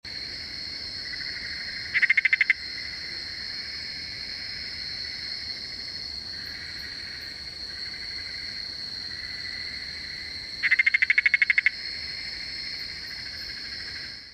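leptobrachium nigrops, Black-eyed Litter Frog call; Lower Peirce, Singapore, 17 Nov 2016; Megophryidae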